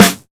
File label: clean snare drum f sharp key